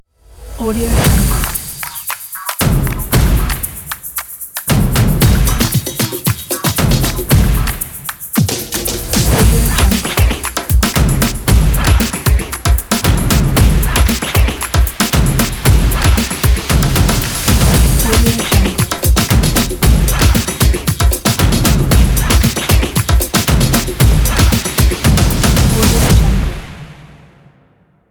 stomp intro

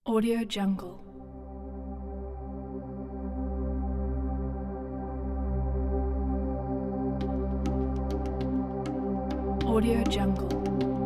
background arabic ethnic soundscape; octahedronmusic

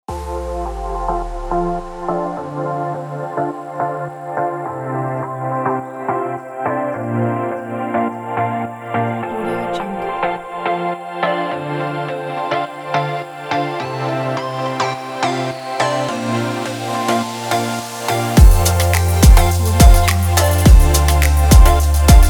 travel vlog

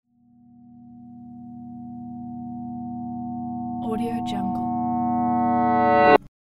tension building riser

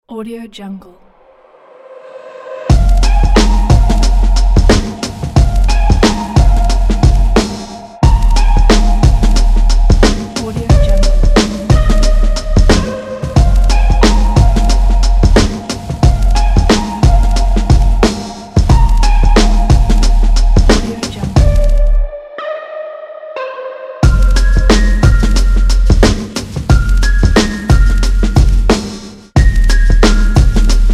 dreamy melodic hiphop

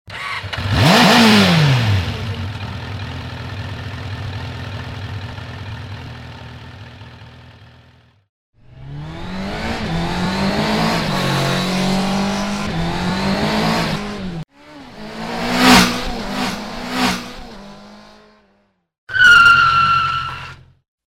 speed bike

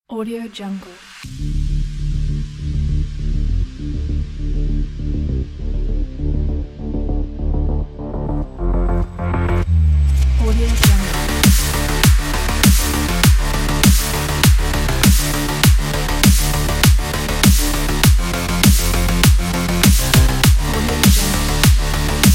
electro sport

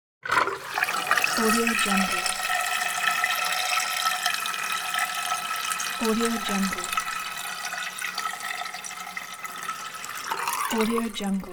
draining sink

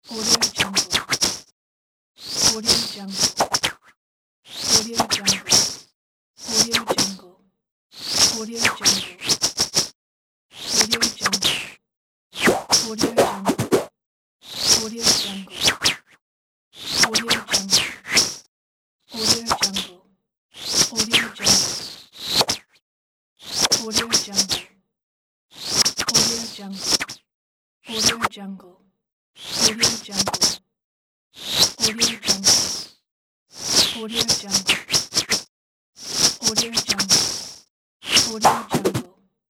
kung fu weapon pack

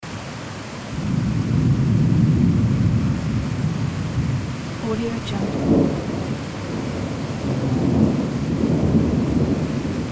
rain and distant thunder

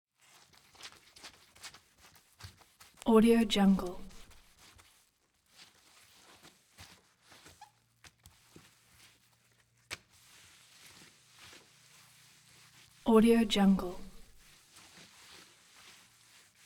scrubbing floor with small rag; collanderson

